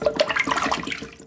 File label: Water Splash Toilet Water; SFX Kit; Foley - Misc